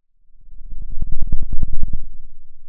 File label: pop, Sound of a thunderstorm